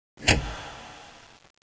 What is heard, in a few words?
switch